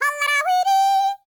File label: YODEL, World Voices; ILIO; Vocal Planet VOL-5; BAVA. YODEL